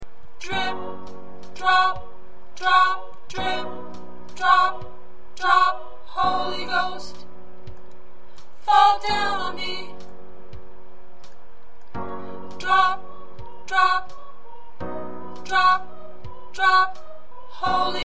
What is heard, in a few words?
Tenor, choir